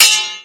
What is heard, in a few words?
sword clank metal; Mount&Blade Warband